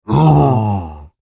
Monster Zombie Frankenstein Snarl Creature; Zombies; Horror; Zomby Sound Effects; Animal

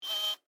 Security Camera Servo, Auto, Focus, Short; Technology; BlastWave

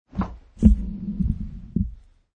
Respirator

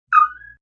Chirp; Chirps

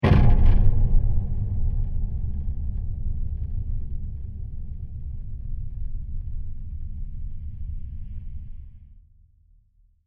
Mysterious Impact With Delays; Digital; Impacts; Crafted Signals

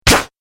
Laser Zap, Laser Gun

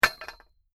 Metal Impact Rock Drop On Metal Pipe; Stone slab, brick or cynder falls on solid metal with a good clank

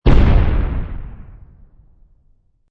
Explosion Short, Powerful, short explosion.; Bombs; Sound Effects:Explosions

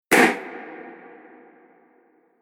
Epic Crash Bang, impact; Miscellaneous Impact Sound Effects; Impact / Explosion Sound Effect

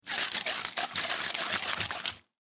Food Whip Cream Can Shake; Whips; Sound Design

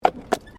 Tram Horse Drawn Horse Step Gravel; Horse drawn tramcar; Carriages; Vehicles

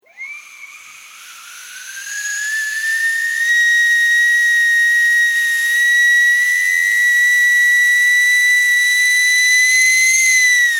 Whistling kettle on a stove; Kitchen; Household; Kettle Whistle

